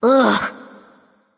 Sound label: ugh; smite; terra